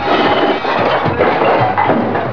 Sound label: Fight; 特酷声音素材库